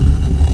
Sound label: stone plat; svencoop; underrain